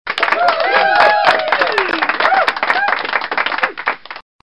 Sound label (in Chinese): guzhanghuanhu; vv audience